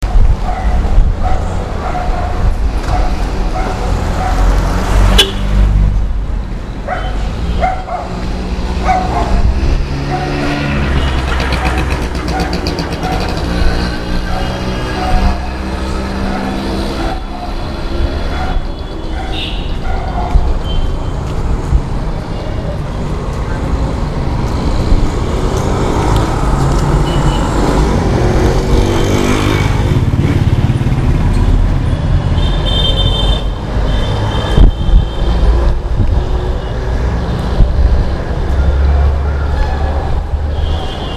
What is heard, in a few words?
walk in thurs nite 830pm, india